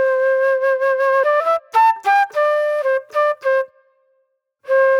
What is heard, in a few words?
Flute